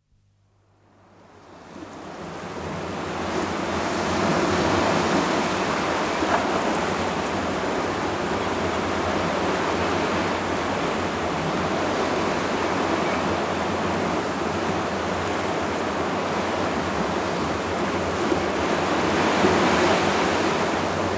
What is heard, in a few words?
Cloudy Day At the Beach, Nature Sounds; Dr. Sound Effects; 210 Nature Sounds - 20 Hours of Relaxing Natural Ambiences for Meditation and Sleep